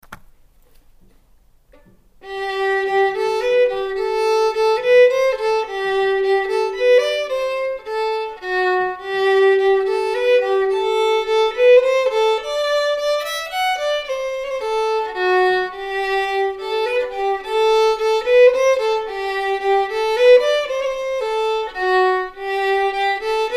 Spanish Cloak, fiddle

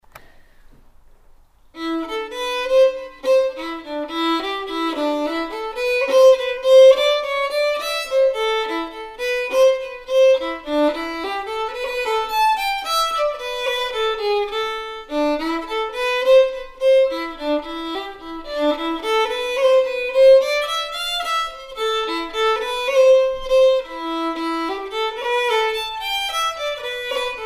Knocknagow Jig, fiddle

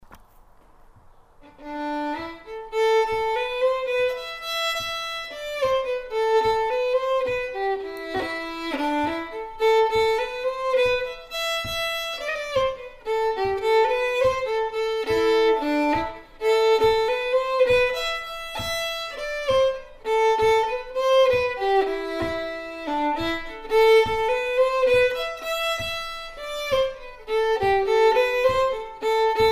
Jig, fiddle; Coleraine Jig

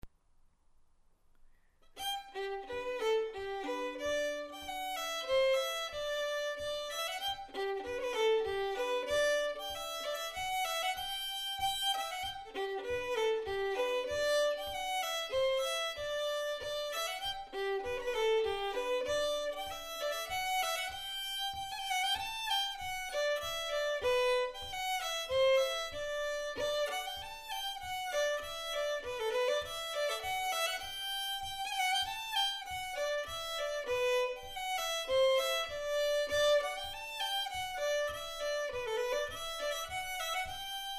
Polka, fiddle; Mealagh Valley Polka 2

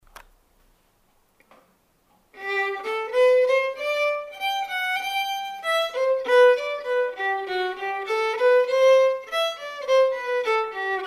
hornpipe, fiddle

